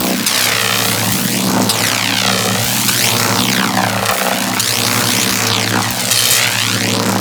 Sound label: Chain Lightning Rifle Loop; Warframe; Corpus